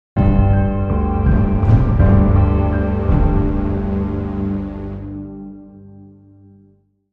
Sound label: Ghostly Chills Sting